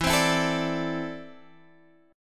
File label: C 6th Add 9th E